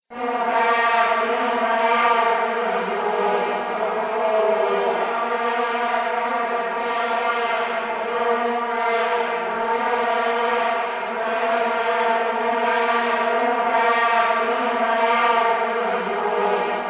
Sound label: vuvuzelas in a hall